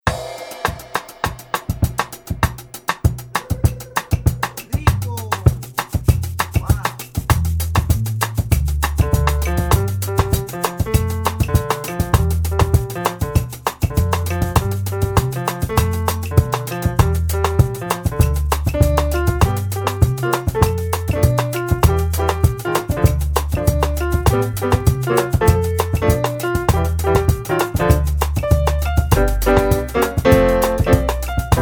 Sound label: Bossa Nova